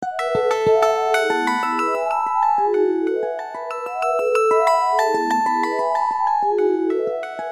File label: wind note